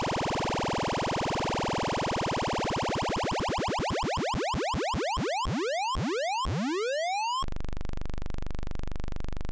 Crazy roller

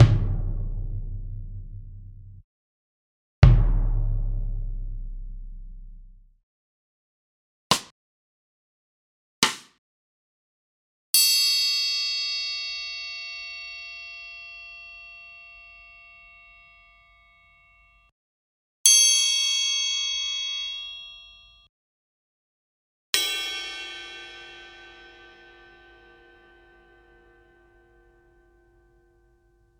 Marching Band Kit

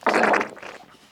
Baby Poops In His Pants; Vauva Kakkaa Housuihin

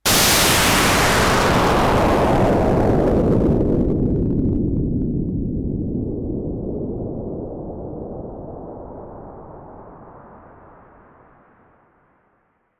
Giant Explosion

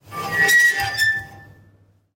Metal Screech

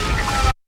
Slide

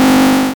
8 Bit Wrong Item

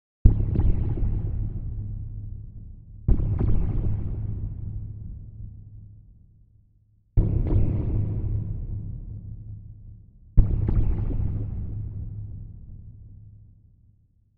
Boom

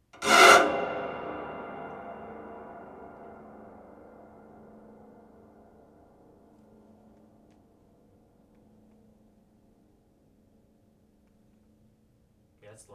Stabscrapes

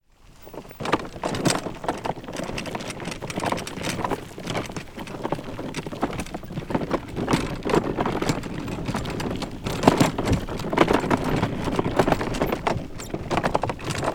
Horse Carriage

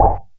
Boom

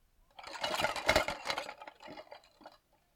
Rattling Of Cans

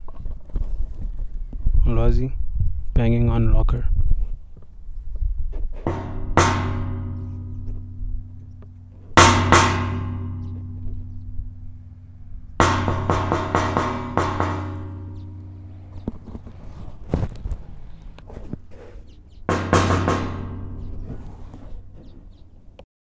Banging On Locker

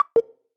Open Button

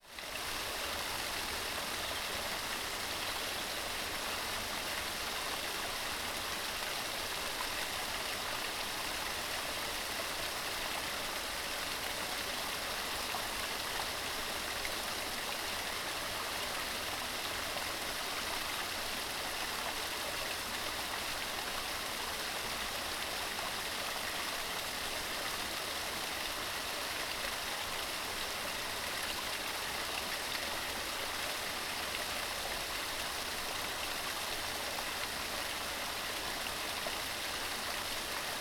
Waterfall Small

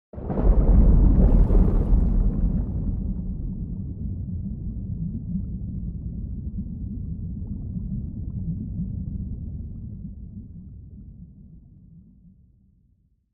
Splash Underwater With Underwater Whirlpool

